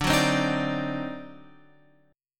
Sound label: D 7th Flat 9th D down Guitar Open F; D7b9; strummed